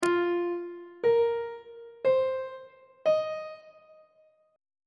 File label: e sharp dominant 7th suspended 4th chord intervals on treble clef